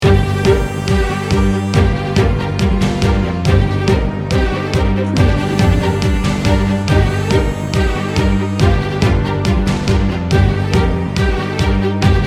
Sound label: breaking news loop